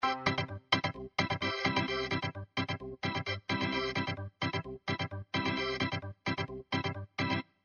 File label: chord lines ska; Midi Files 1000